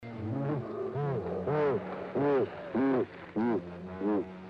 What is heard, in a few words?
guiron